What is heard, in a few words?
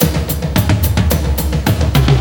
drumloop; awe32